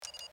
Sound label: desk viewer beep, computer